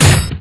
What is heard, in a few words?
Tile Stop Noise; Take Your Pick